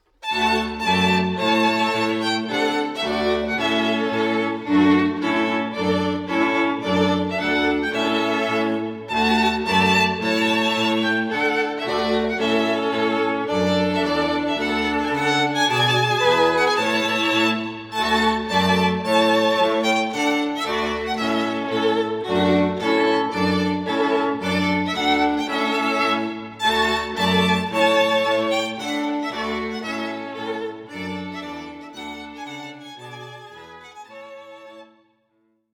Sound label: Trumpet Tune; Purcell; Charlotte Strings for Events